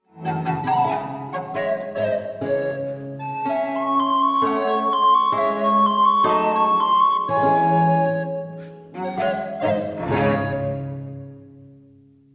sonata in d minor for 2 flutes violins and continuo; no 6 of 12 sonatas 1727